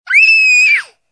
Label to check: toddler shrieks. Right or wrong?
Right